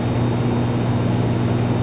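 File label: Engine normal; hover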